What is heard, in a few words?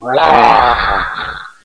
attack; cof